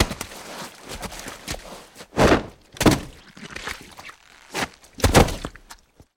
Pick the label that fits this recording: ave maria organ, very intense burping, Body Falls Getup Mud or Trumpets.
Body Falls Getup Mud